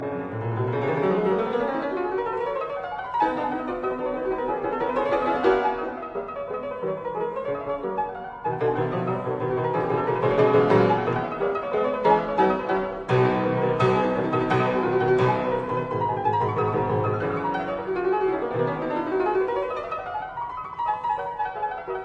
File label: Piano Sonata No 1 in C major Rondo Presto; Op 24